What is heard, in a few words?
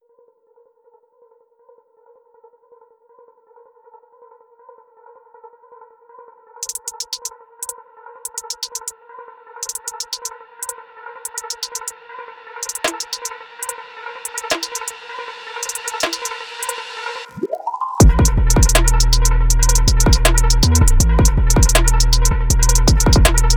Arp Beat